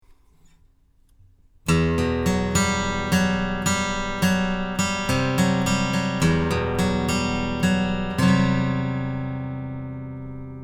Premium Gtr; FAR m251

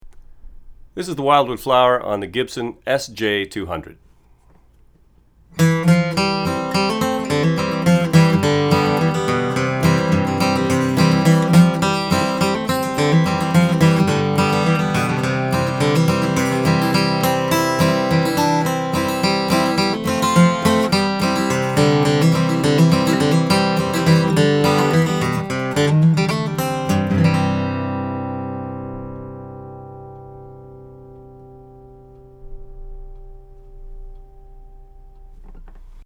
APEX450 SJ-200 GTR

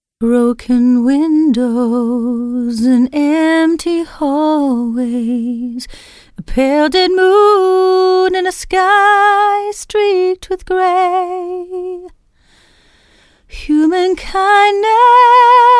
FAR 47 female vocal

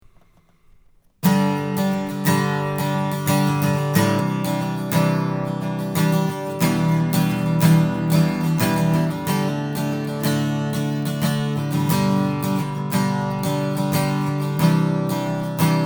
APEX 580 Acoustic Gtr

